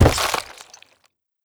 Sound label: flesh; Rust